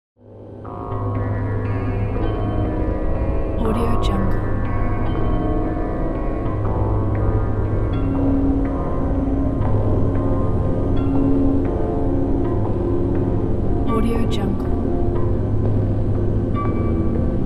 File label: horror nightmares